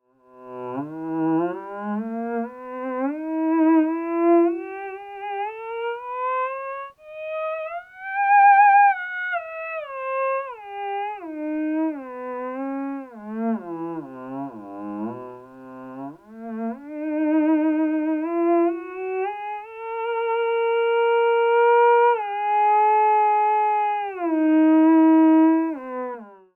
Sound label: ews resonator, theremin